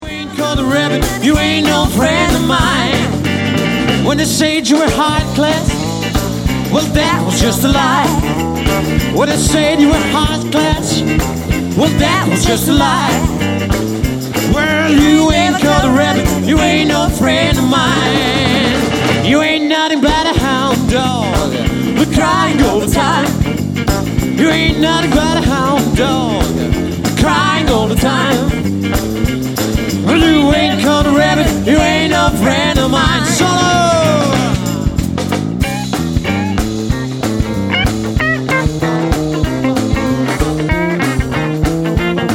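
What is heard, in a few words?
Quintet (voice, guitar, guitar, bass, drums)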